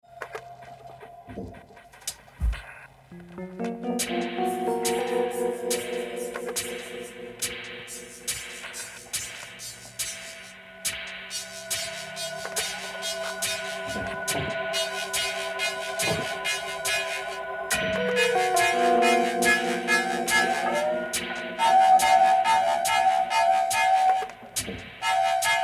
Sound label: Bubble beat